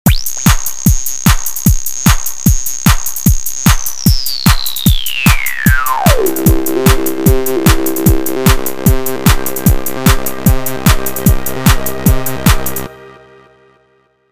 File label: Bass